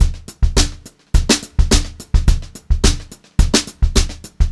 HOTROD BEAT, Brushed Drum Loops; USB Soundscan vol.39; AKAI

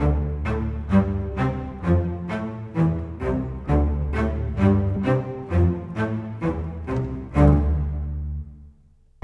Bass Cello; Sonic Foundry (Sony Creative Software); Orchestral Series VOL-4; Rock and Pop; Rock-Pop 15

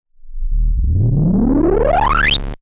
Monotron Short

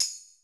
TAMB, SY99; Crypton - DrumBase5000

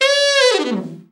BRA ALT FALL, SAX; Best Service Gigapack 1

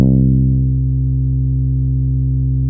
Muf Bass C3, Fairlight CMI IIe

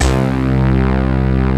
C1, VARIOUS BRASS; AKAI S6000 CD-ROM - Volume 1; BrassWind

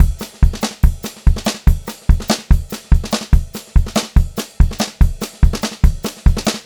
144 SPEED, Pop-Rock Drumloops

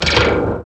ELECTR, Techno Perc; Roland L-CD701

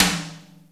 rim tom, Roland JD-990

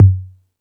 Beat Box, E-MU Pro-Cussion

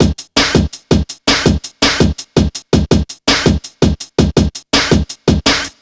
165, drum loops, drum & bass

